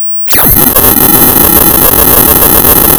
Sound of tearing paper, center

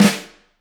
room Flam, drum

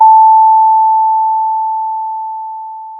Sound of a school bell

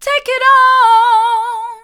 take in on hard, vocal

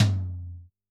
Tom, drum

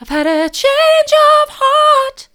i've had a change of heart soft, vocal

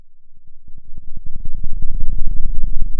pop, Sound of a thunderstorm

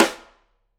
Piccolo

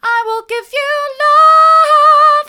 mid, vocal

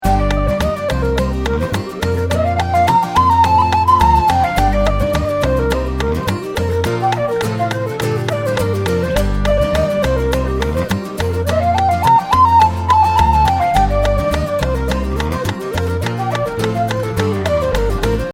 loop mono

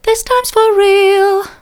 this times for real soft, vocal

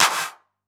Elec Clap, drum

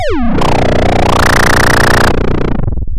pop, chainsaw

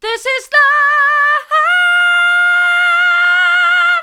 this is love hard, vocal

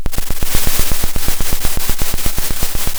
pop, Sound of a drum roll

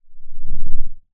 pop

